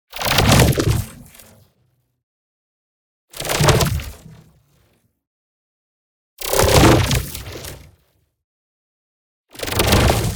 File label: IMPACT MEDIUM Sizzling Sword; boom library; Matter